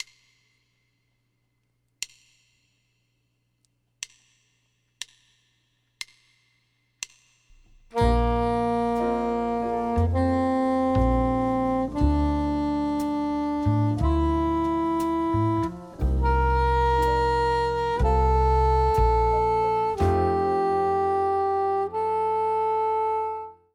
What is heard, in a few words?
Bb Soprano; First Steps